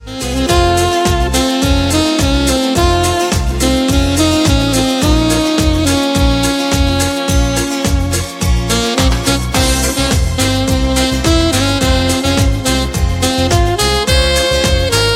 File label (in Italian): la cumbia del sole